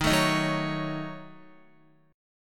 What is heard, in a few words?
Eb Minor 7th Flat 5th, Guitar; Ebm7b5; strummed; JGuitar